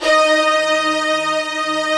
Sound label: fast strings, Fast & Slow; Optical Media International; Sonic Images Library